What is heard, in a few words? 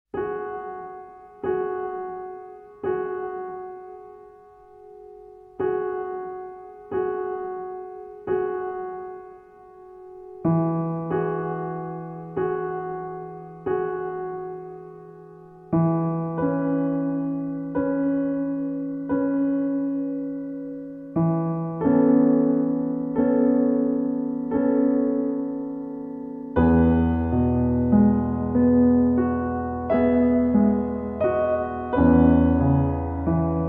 Relaxing Piano Music - Piano Solo